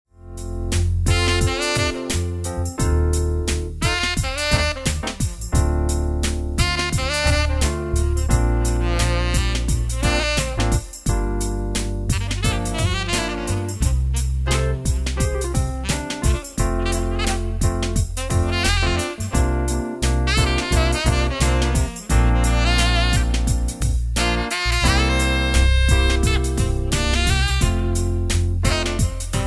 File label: bass and whirly sax; TV Themes